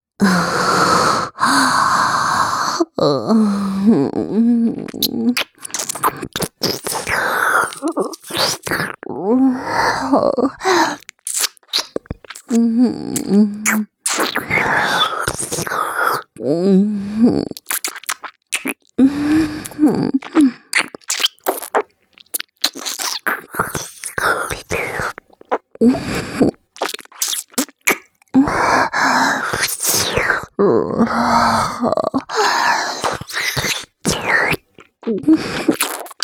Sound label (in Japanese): 舌絡め, ASMR, キス